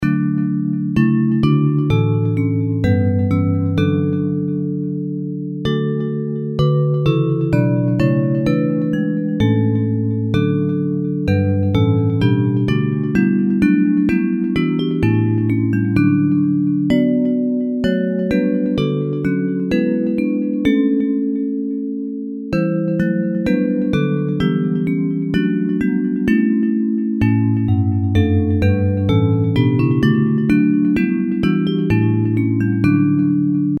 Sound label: Bells; Hymns